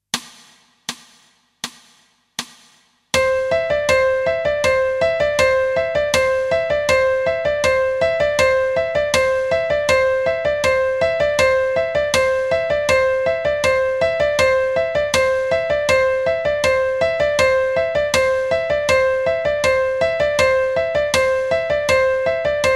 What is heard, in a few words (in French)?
croches doubles croches; rythmes faciles